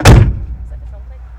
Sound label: car door shut